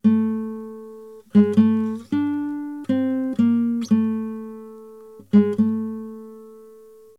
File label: guitar original